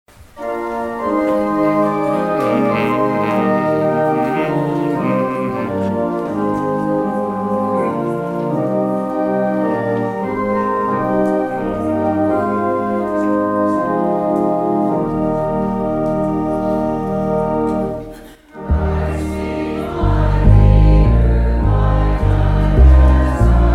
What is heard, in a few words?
Closing Hymn